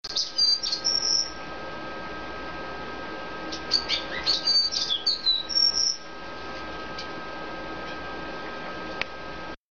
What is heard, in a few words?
Cordon Bleu, finch